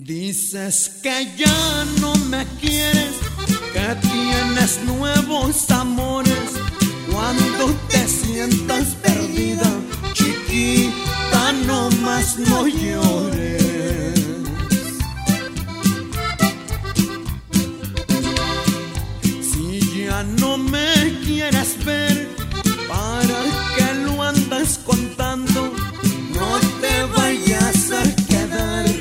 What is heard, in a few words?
Mexican Ringtone